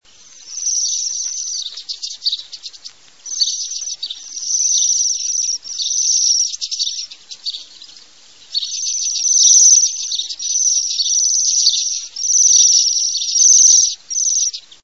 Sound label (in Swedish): Sidensvans